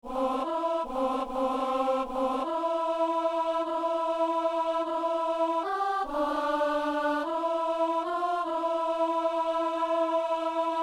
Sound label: alto